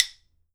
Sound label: KALAAW, HAWAII PERC; AKAI S6000 CD-ROM; WorldSounds